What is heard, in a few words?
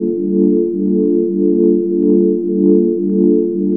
God Chord Pad; Pads; M8